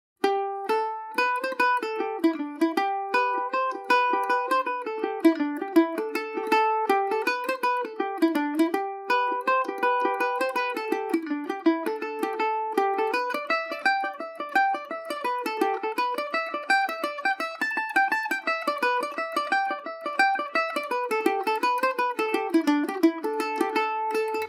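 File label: A dorian, Irish mandolin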